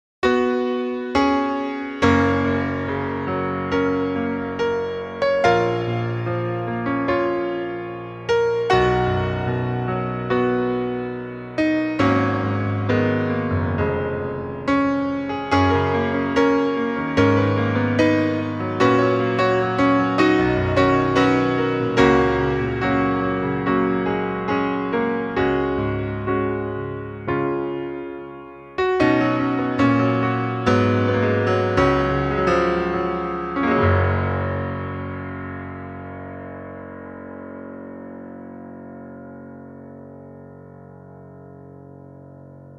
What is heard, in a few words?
Piano stadium